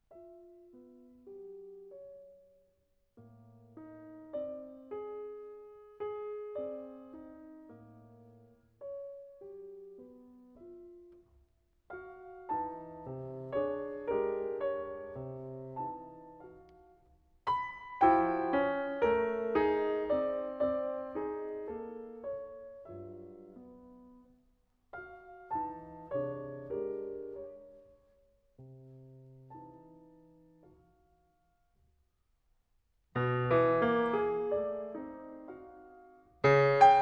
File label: Variations for Piano, Sehr massig; Boulez